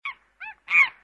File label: monkeys monkeying